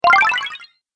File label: Restore Health, Video Game Sound Effects